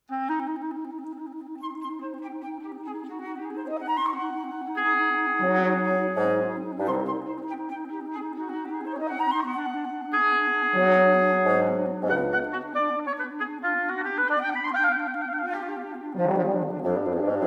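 Bläserquintett Op.95 III Allegro scherzando Trio Andante con moto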